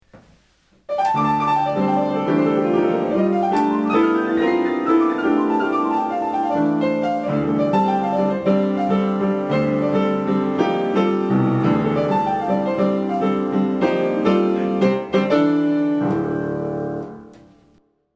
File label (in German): Piano Skizze; Akkordeon